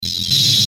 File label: static chopper; Static Chopper Surge; Production Element Imaging Element Accent Transition; Blastwave